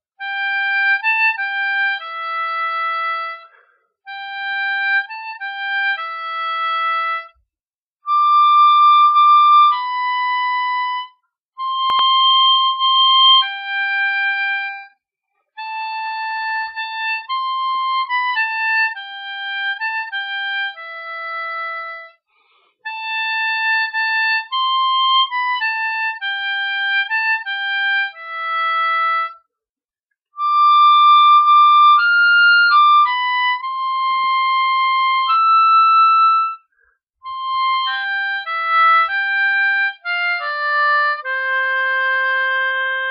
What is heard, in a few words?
Silent Night, 10 hole harmonica